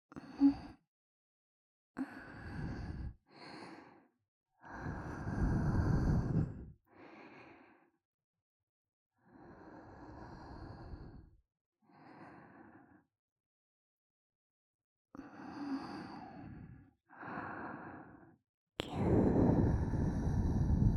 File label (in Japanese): 超密着耳舐め添い寝, ASMR